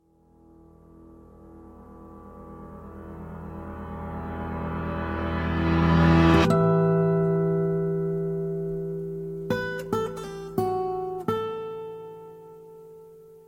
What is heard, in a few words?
RINGTONE